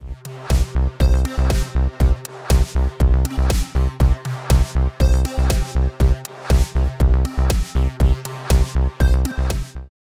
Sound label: Rapid Loop Constructed; Parawave